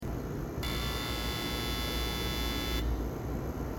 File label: loud "Buzz" noise; stuy town; Have you heard the loud "Buzz" noise coming from the T side of 19/21 Stuyvesant Oval?